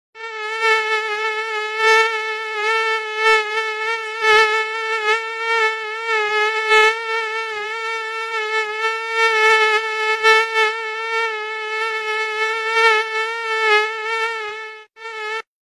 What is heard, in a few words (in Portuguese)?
som de mosquito